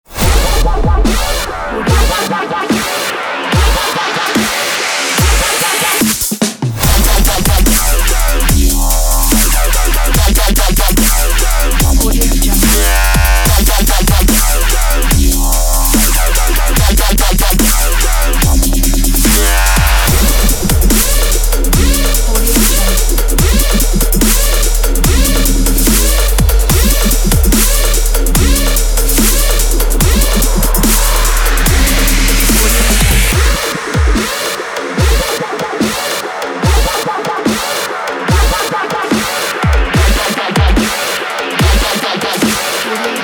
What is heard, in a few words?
heavy dubstep